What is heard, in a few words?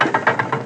Door Portcullis Open